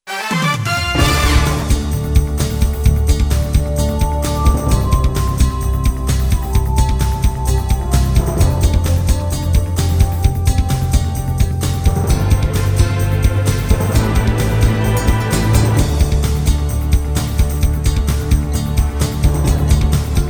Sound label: Weather Bed